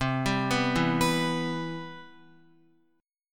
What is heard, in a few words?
C Major 7th, Guitar